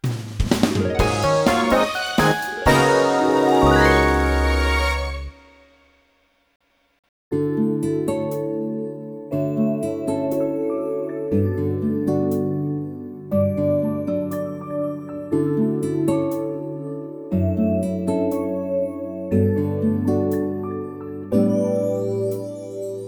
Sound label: Jingle Start